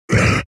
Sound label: monster grunt